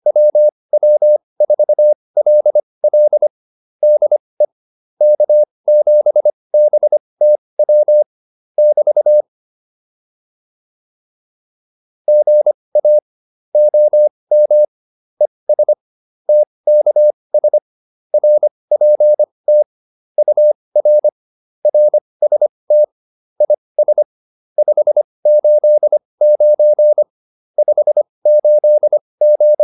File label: QSO, intermediate practice files